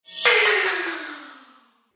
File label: Magical Impact Accent; Magic Sound Effects